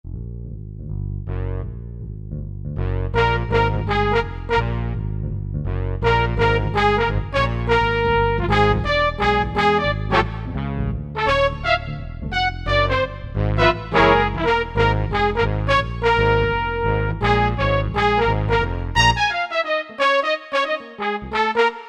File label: Jazz Quartet